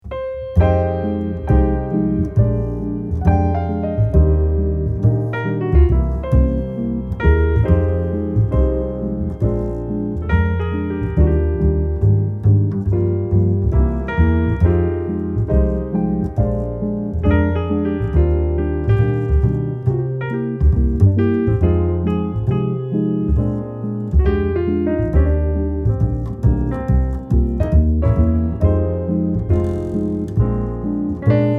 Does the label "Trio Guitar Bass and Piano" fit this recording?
Yes